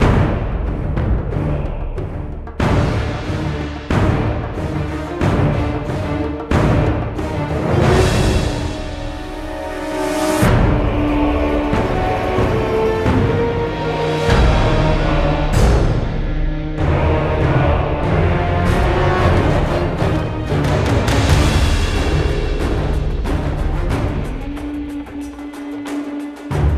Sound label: Combat - Cinematic